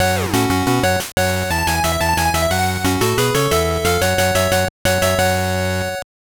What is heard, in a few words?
All Clear; Mega Man 5; Gameboy